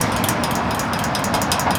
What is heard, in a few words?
Castle Sculptures, percussion; Downtown Houston Root Memorial Square